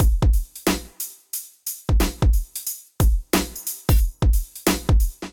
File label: Break Kit groove 90 bpm; MIDI Clips; Break Lab Kit groove; Ableton; Factory Packs; Drum Essentials